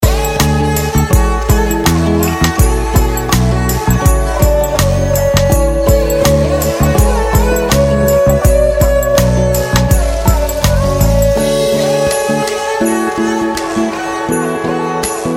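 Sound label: Violin Instrument Music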